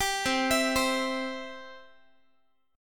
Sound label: C Major, Guitar; arpeggiated; 22,20,19,22,x,x; 4A,4E,5A,5D,5G#,6C#